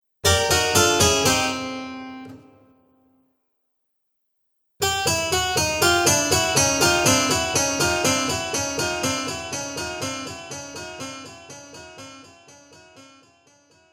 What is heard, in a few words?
Fail Harpsichord Collection; cartoon music; Cartoon Music Sound Effects